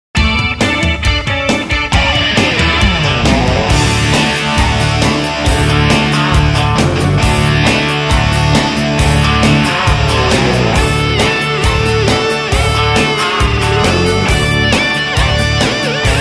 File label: Country Sonar